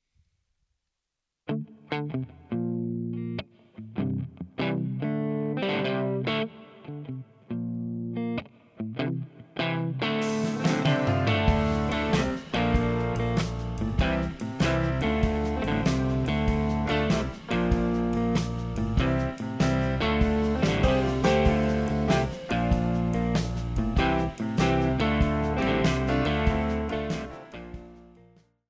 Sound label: 96BPM Dm